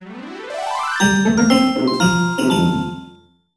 Rat Maze Game Success; Christmas Carol

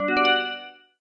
Sfx - Combo

